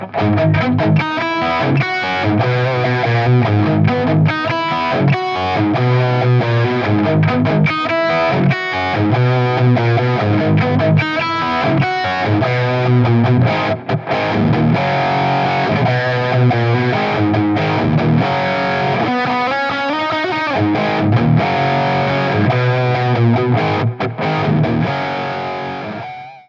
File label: Wet - Hot Rod - Bridge, Pickups; BYOG